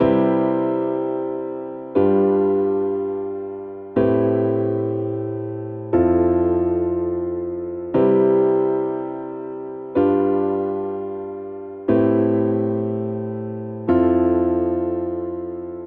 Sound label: Progression Upbeat I - V - vi - IV C Major 121 bpm, Grand Piano; MIDI Clips; Ableton; Factory Packs